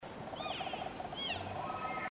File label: cow mew, elk; Cow Mew9; Cows